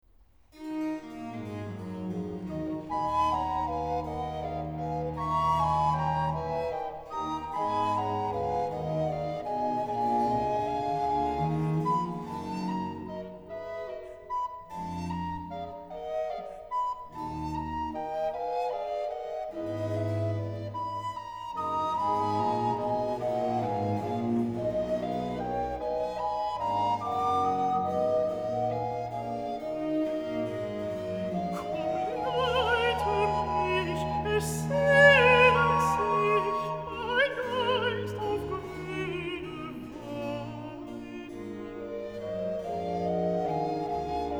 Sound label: Aria Alto; Er rufet seine Schafen mit Namen, BWV 175; Komm, leite mich; Bach, J S